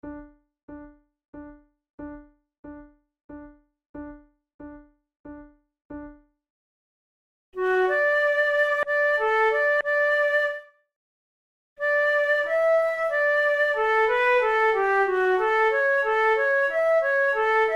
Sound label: duet